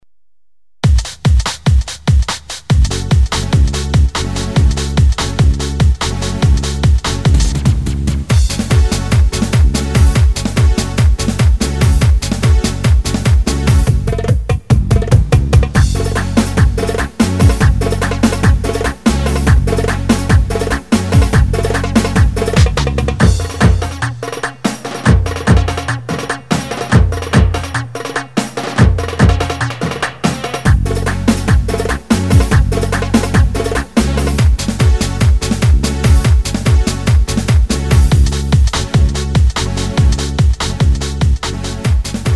pop roman, ketron ritim

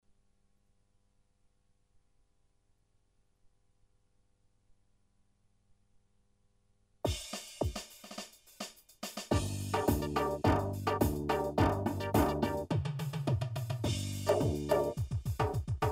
halay ritim; gem wk8; halay demo

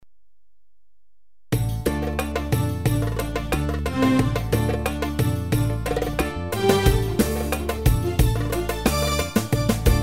arabesk vahde, ketron ritim

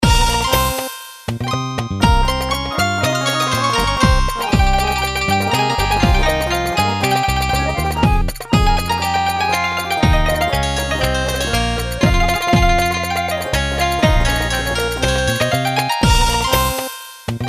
gw8 fl sebari ritim deneme; fl9

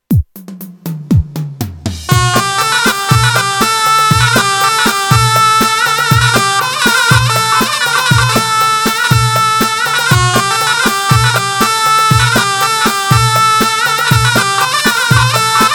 zurna 4 4; korg pa 80

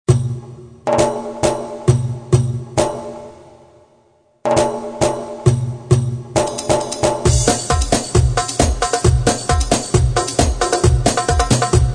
darbuka solo; korg pa1x turk

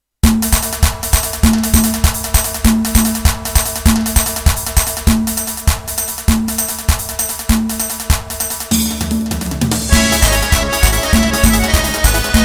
cecen lezginka, roland gw-8

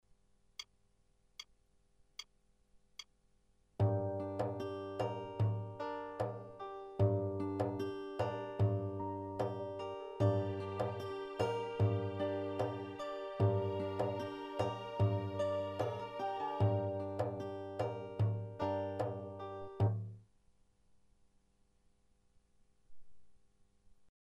fantazi ritim, Gem WK8 SE; demolar 13 sene once yapilmistir bilginize sene 1999 wk8s

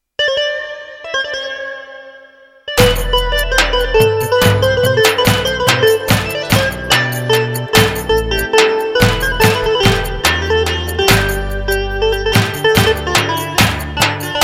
tavas; roland gw8; kerimoglu; tavas zeybegi

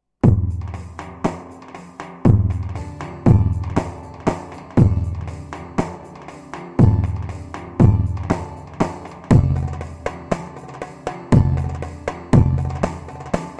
pa 500 tr serenler, korg; tr karisik ritimler

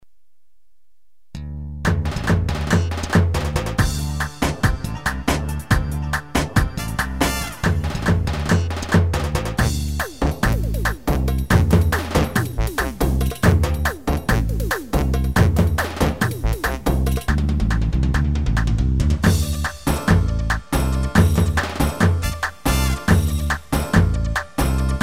ritim, ketron sx3000

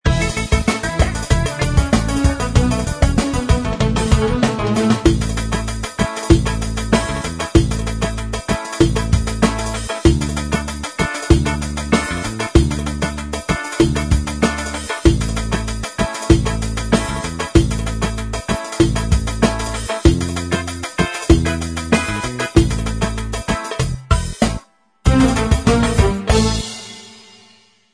style cieft, oriental; gem genesys pro oriental

